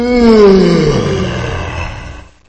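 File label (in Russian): zevanie nizkoe, low yawn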